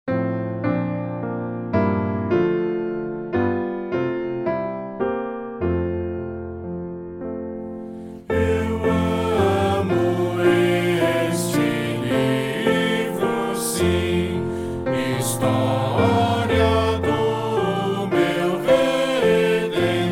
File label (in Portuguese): voz piano